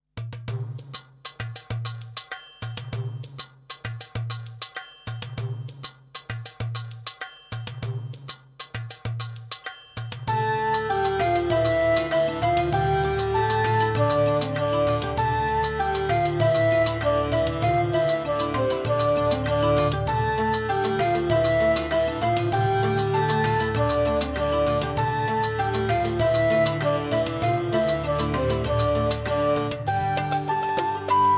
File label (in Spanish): Flauta, Base Completa; Santa Maria